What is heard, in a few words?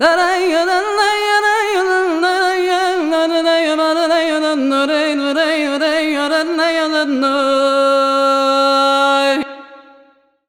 THA VOICE L; USB Soundscan vol.63; AKAI